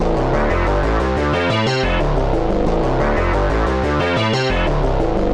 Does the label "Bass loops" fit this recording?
Yes